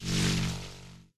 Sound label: Crystal Blocked tower Loop End; Frog Infuser